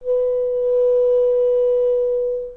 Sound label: B4 covered, flute; modern